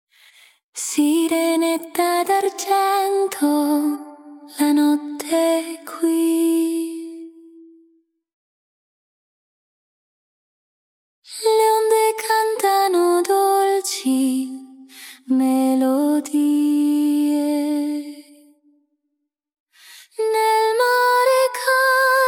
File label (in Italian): Ninna Nanna